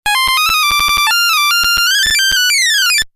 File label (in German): feldlerche, Vögel; midi; Polyfonisk musik; siemens